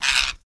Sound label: Parrot Squawk Angry